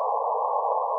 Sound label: CW LFM QRM